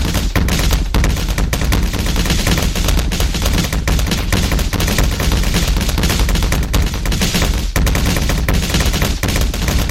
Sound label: ragdoll glitching; gary's mod